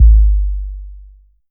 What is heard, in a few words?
Bass Drop, 808; Street Anthems Sound Kit